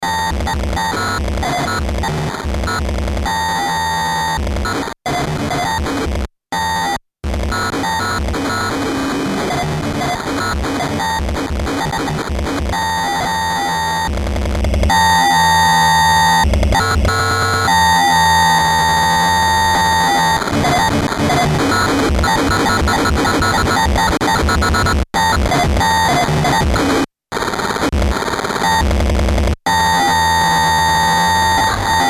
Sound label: noise